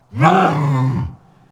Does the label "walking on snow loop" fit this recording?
No